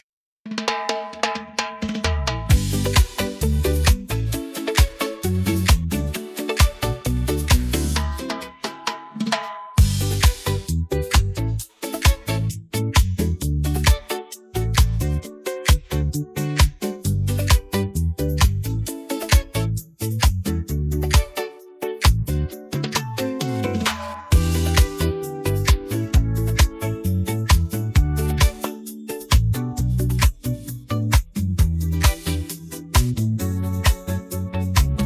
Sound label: no vocals; split by lalal ai